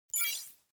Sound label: remove From Cart